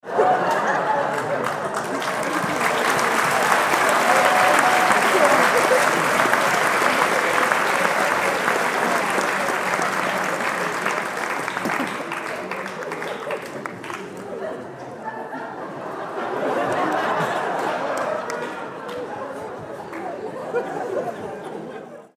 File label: Audience laughing and applauding in theater